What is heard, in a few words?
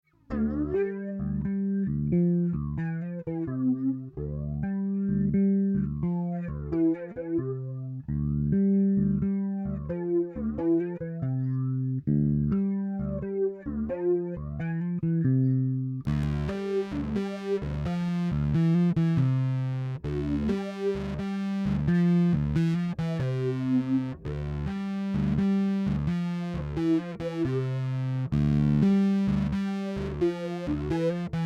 flange